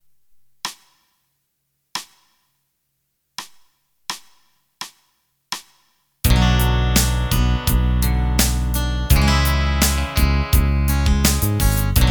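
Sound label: Rock Ballad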